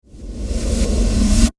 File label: Horror Reverse Delay Short; Scary Soundscapes; Production Element